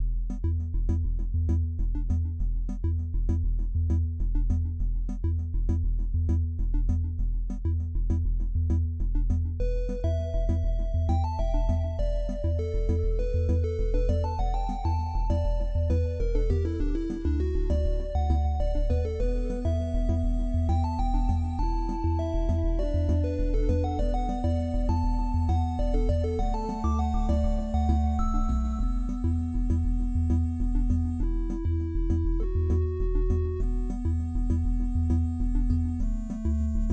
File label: tranquil